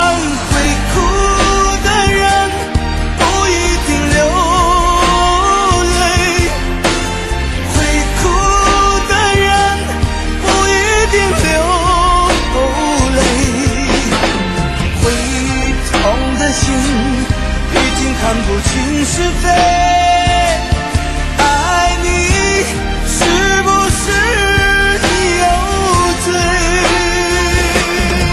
Chinese Song

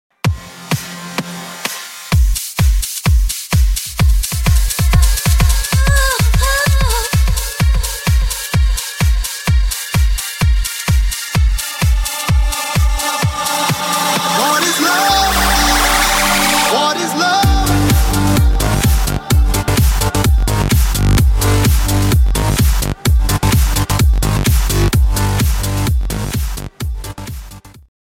Classical Bass

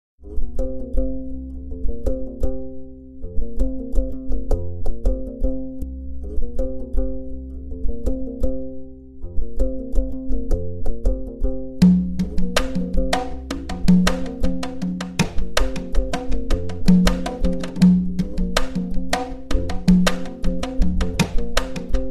GNAWA

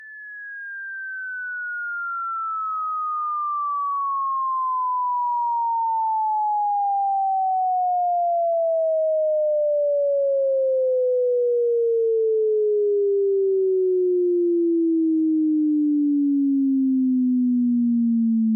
Sine Sweep

